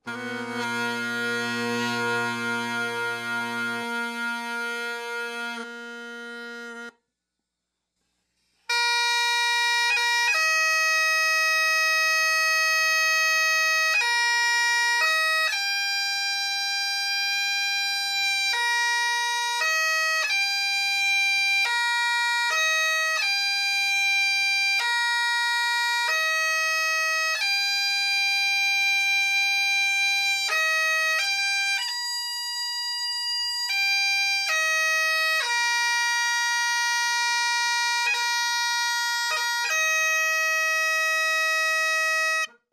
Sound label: taps